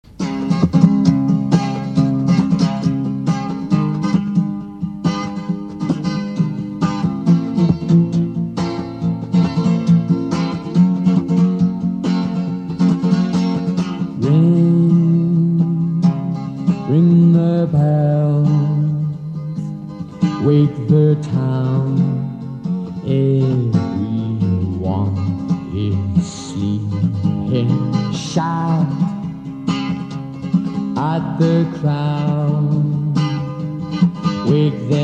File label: Acoustic